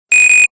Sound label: Buzzer, Nokia N78 digital tones